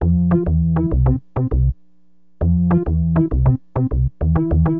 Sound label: SYN BAS, Acid Jazz Funk